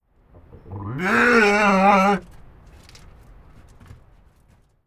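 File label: NZ fur seal in air Full Threat Call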